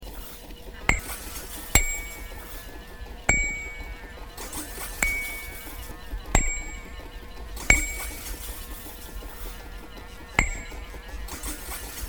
Funny Machine; Cmusounddesign

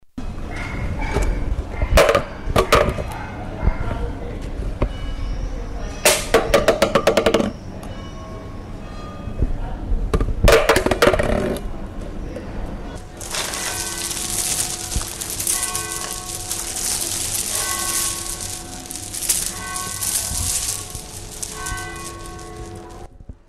Doorknob

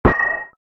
Metal Beads

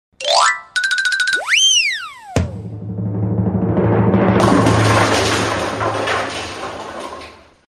Piano Effects

